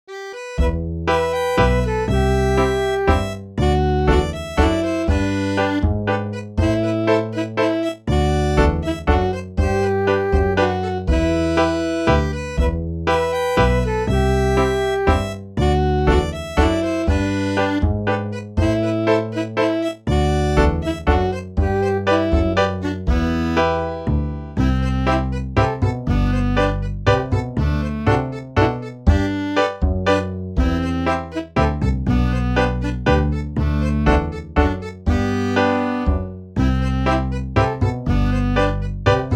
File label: mazurka; de salou